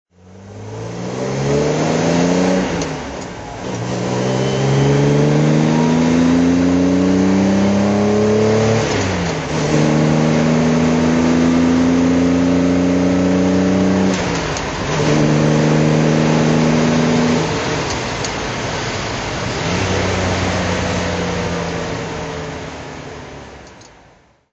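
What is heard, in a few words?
aston DBS drive, engine sound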